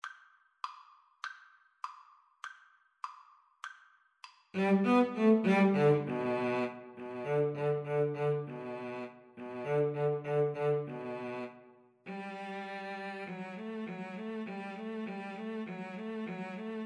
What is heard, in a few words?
Clarinet Cello Duet